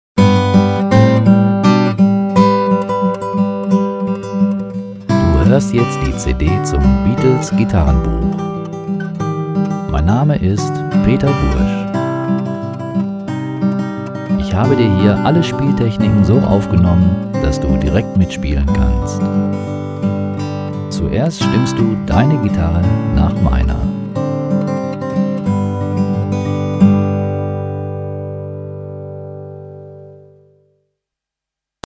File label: beat